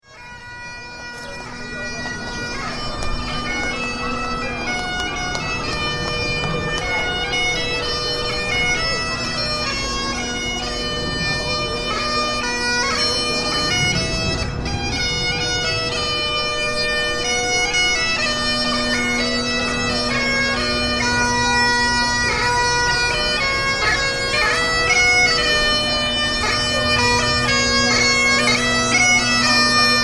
Parade Piper, A single piper leading a procession with passing people.; Bagpipe Street Procession; Parades